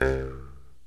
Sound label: JEW'S HARP